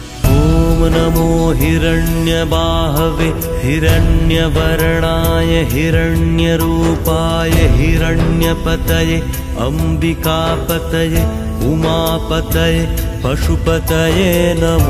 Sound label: Shiva Mantra Stotram